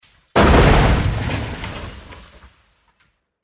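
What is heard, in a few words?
fall on asphalt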